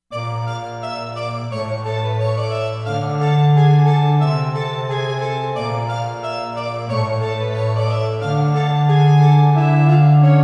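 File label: ethno strings, Korg; Korg MSC-13S Ethnic 2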